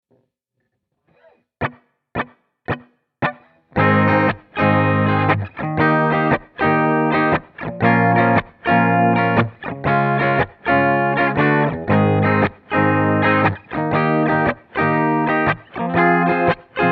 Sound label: key change; xmas